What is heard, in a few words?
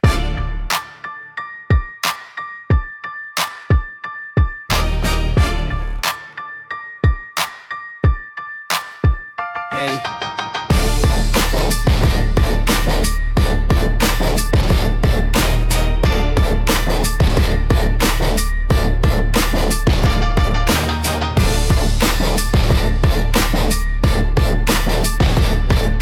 HipHop Segment; haha fm